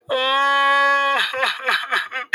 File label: crying